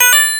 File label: Coin; SlapShot